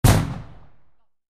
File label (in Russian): zvuk vzryva petardy